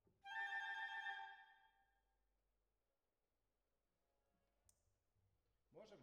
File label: Cellos Over